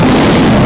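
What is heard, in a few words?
Flamethrower Mid Loop; Gunlok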